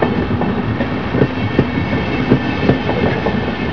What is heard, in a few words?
train